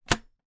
household small metal ventilation vent slide open or closed